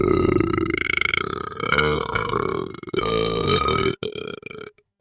burp long

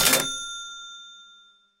cha ching

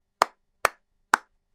slow clap

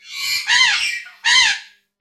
parrot squawk

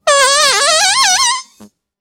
fart classic

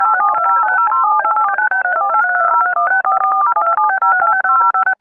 dialup modem

